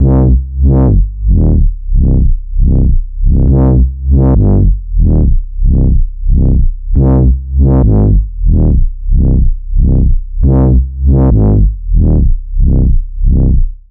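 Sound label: Bpm138 Bass Loop Cm G# major; Clear; Prime Loops; Dubstep Illusions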